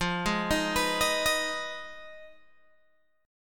F Minor 7th Flat 5th F down Guitar Open G

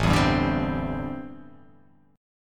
A Minor 11th A, Chord

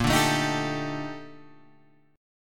A sharp Major 13th, Guitar Open G

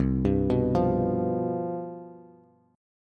C Major 7th Suspended 4th, down, Bass; arpeggiated

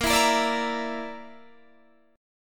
Bb Major Flat 5th Bb down Guitar DADGAD, chord

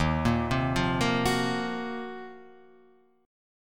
D sharp Minor 6th Add 9th D sharp down

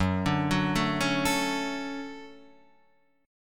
D-Major 7th Gb

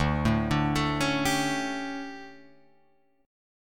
D Major 7th Suspended 4th, Guitar, Open F; DM7sus4 arpeggiated

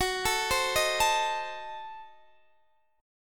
F sharp Diminished 7th F sharp Guitar Open D

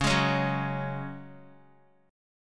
Db Suspended 4th Db, Guitar; strummed

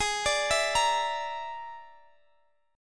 Bb 7th Flat 5th Ab

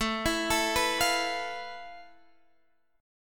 F Major 7th Flat 5th A, guitar chord

